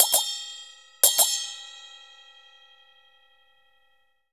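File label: China cymbal; Spectrasonics - Heart of Asia CD2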